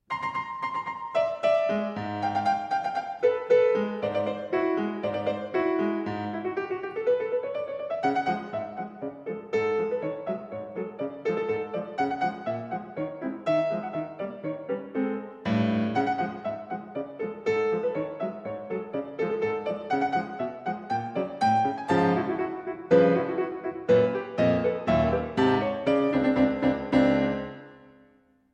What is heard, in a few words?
crooked trumpets